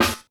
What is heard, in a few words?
HIT, SNARES; Sampleheads; New York City Drumworks VOL-1